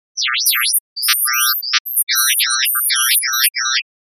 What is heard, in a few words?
Kaboom; Geocaching